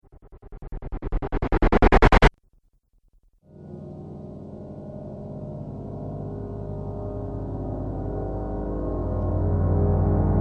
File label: Cinematic Riser Pack; Rise And Dive Sound Effects